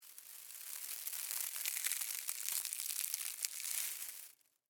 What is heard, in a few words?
Forest Leaves Slow, Footsteps, Single; Human; BlastWave